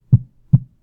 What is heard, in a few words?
Heartbeat